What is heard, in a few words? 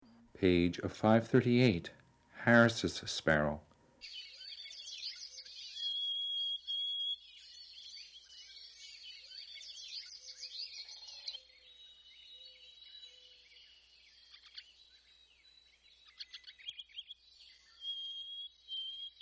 harriss sparrow